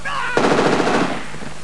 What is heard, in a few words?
Hurt + Machine Gun